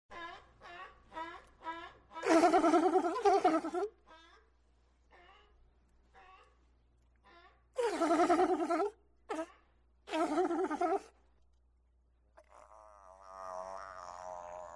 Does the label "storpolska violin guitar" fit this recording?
No